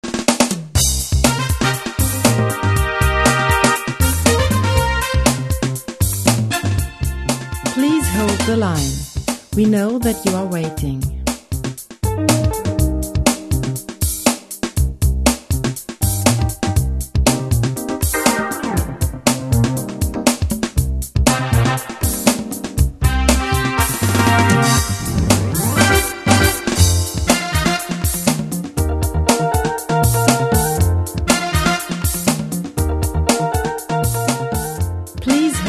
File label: jazz dance